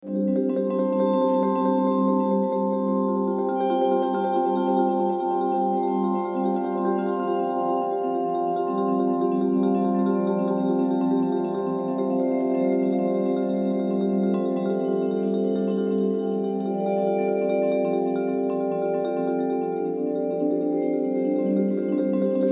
Stairwell Vibes; smile for me macos ps4 ps5 switch windows xbox one gamerip 2019

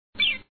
Slip; Wario Gets Straight

